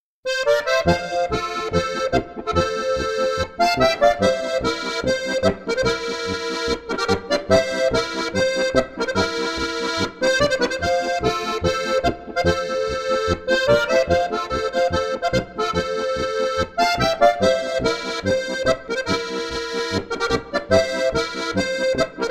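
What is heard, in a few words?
tiroler buam polka, Steirische Harmonika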